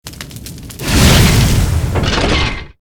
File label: catapult cast